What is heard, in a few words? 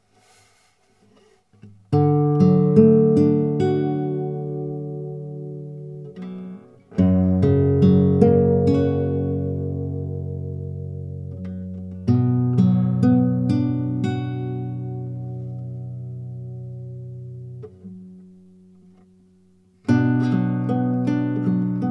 yamaha g 120